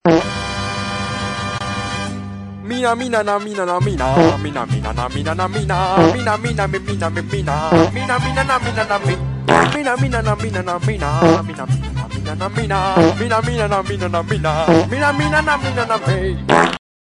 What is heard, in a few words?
fart